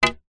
foley wood pine plank short thin 40mm x 10mm end set down hit concrete ground; zapsplat